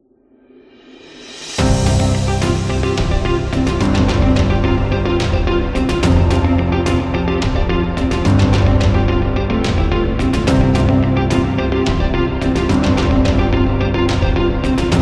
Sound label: A Motivational; hi tech